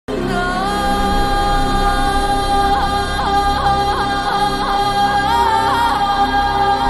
ancient lamentation music

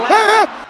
hehehh; the rock

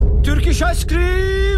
dondurma alert

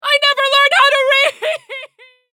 damage twinkle

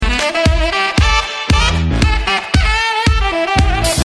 retro funky sundance remix

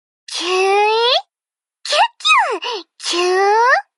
squee; lyria

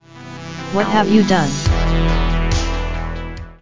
music

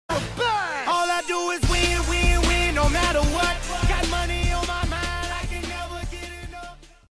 free donation song for twitch

